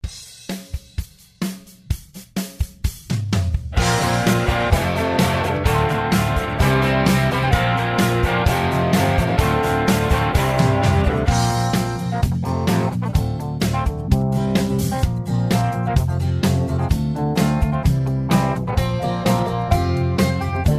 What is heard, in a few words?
Instrumental Lower Key